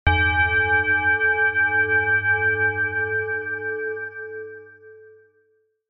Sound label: Meditation bell ring chime tone